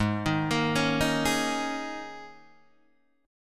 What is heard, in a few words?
Ab Suspended 2nd Suspended 4th Ab down Guitar DADGAD